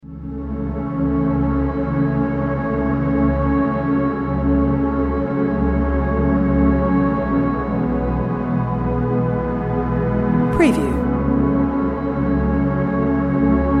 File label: Ambient Calm Relaxing Ambience Background Pads